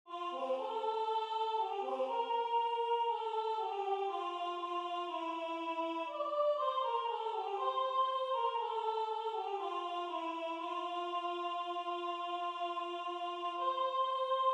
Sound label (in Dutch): canon Sopraan; dames